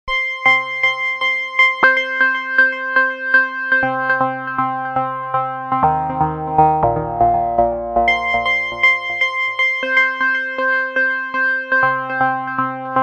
Aquarius Synth

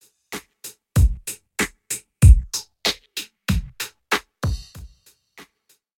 KP3+ Center Cancel

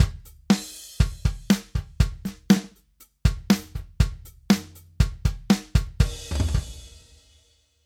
Drumloop trocken

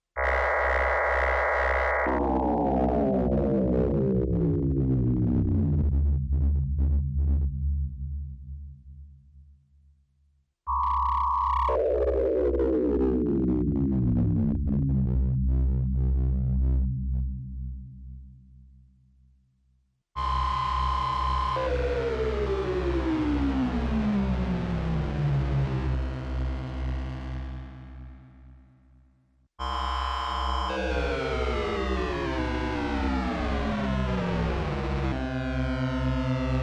FM RM XOR; TubeOhm Jaeannie

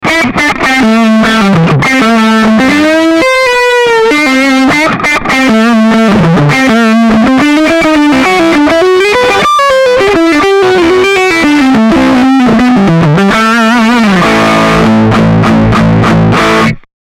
Raw Engl Retro Tube

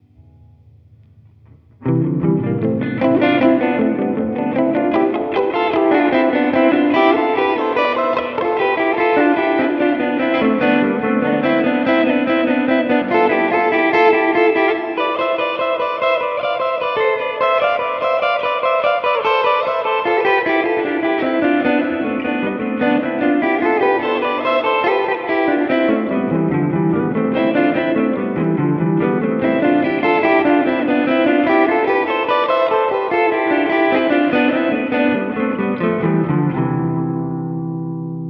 Amin 3rds Solo line

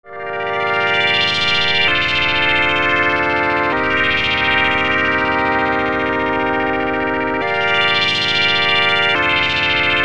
SH01a Chord Mode Sweeping Pad

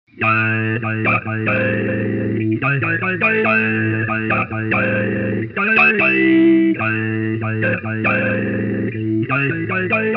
Electro-Harmonix Stereo Talking Machine

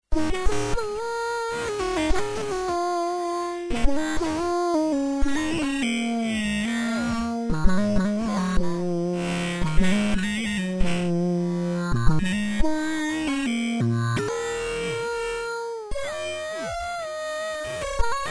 analog drive mono lead

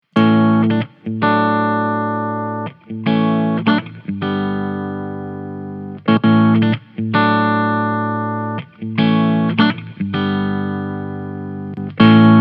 VOICE

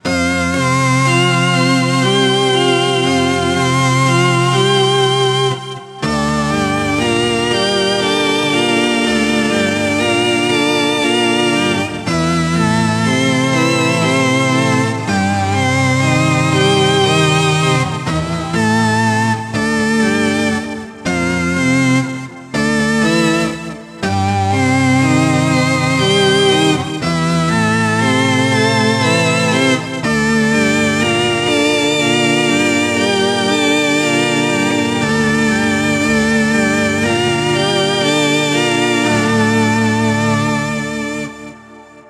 Mooer GE 300

